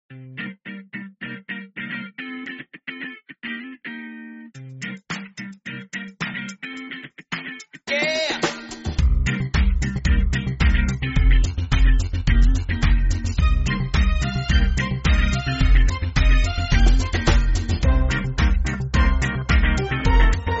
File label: Lobby Music Disco Edition